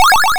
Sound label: YM Arp C#; chip hits; arps; CHIPSHOP DELUXE